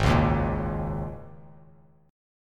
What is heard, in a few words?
G sharp Suspended 2nd Suspended 4th; strummed; 4,4,1,3